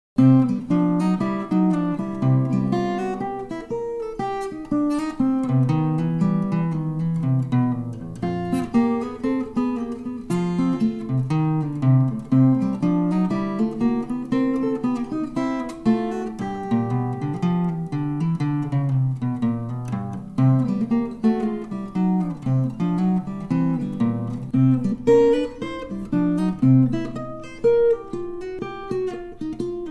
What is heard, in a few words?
foot tappin' blues